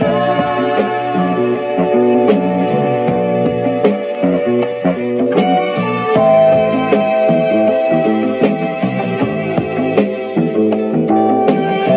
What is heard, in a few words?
guitar and voice, Loops, Phonky